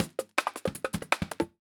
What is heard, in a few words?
jungle juice 160